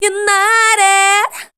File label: voice spectral, ly short